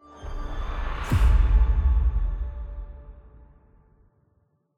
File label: UI QUEST Accept